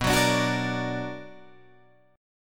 B Minor Major 7th, Guitar, Open G

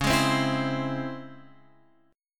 Db Minor Major 7th, DbmM7 chord; DbmM7 strummed; JGuitar

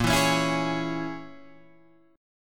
A sharp Minor 7th Flat 5th, down, Guitar, Open G

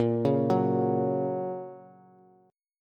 A sharp Minor Sharp 5th, down, Bass

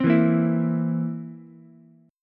A sharp Suspended 2nd Flat 5th, down, Ukulele; strummed; A#sus2b5; JGuitar

